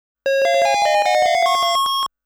Round Clear MUSIC; Namco Classic Collection Vol.2; arcade soundtrack

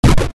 Sound Firework; gamerip; Dream Tower

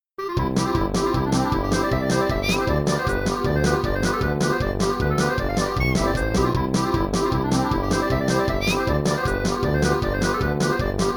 Bedrock Water Buffalos Accordian; The Flintstones; Williams pinball; arcade

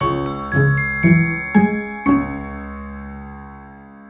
level music finish

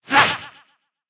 pain

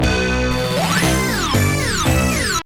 Longer Suspense Music; Riverboat Gambler; Williams; pinball

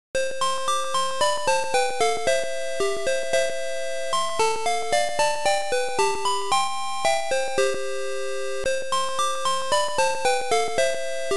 Music Box Theme; Mickey Mouse Magic Wand; Mickey Mouse V Mahou no Stick 1993 GB

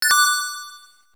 Correct; quiz tonosama no yabou arcade

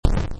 stomp sound

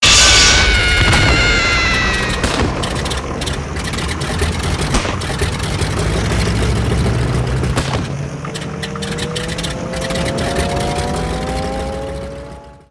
Plane Broken; angry birds rio

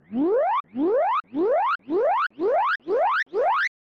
Eraser Dissolve; fun n games 1994 snes

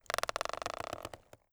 CREAK Wood Tree Creak Subtle; mono

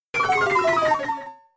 Stat Fall Down

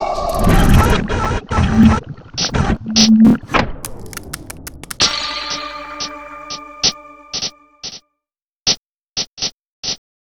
Save Station Respawn

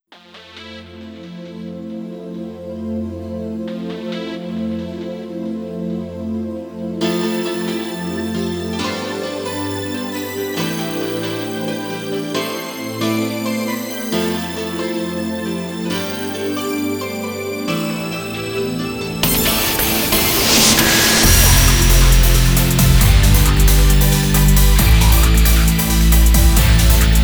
(off vocal); ps2

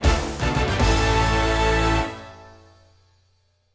Silver Jingle

